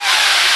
TRUMPET BREATH, Ensoniq ZR-76; 300 Drum Machines

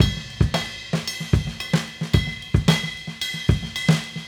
Raw Funk Drums, 70s Analog Funk

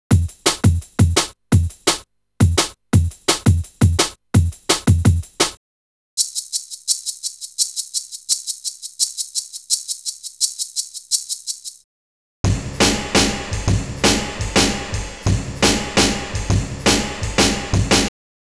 breaks, Jungle; Zero-G Jungle Frenzy 2

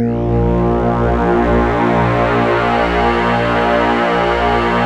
A#, Synclav FM; Big Fish Audio; SPACE1

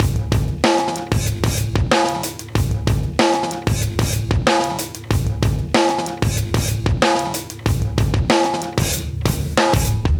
94 Coagulator Beatz, Live Breaks

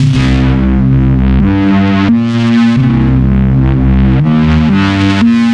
Som Bass, Drum n Bass; KJ Sawka; Drum n Bass & Beyond

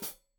Hat Foot, Hi-Hat, Cymbals Stereo

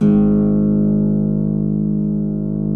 Harp Ab0; Digital Sound Factory Virtuoso

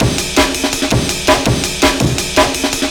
165 Punched Break, Loops; m8 breaks

